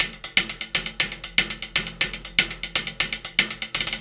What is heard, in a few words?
120 metal; Zero-G; Monster Beats